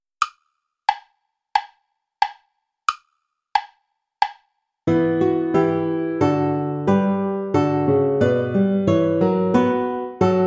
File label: play along without guitar; bach johann sebastian; our saviour christ jordan came